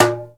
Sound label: Congas, Latin; Roland L-CD701; PRC Latin 1